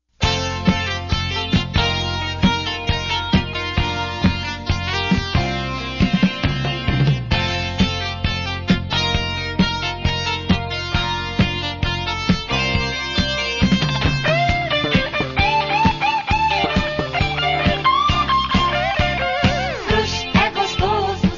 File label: jingle; Crush 80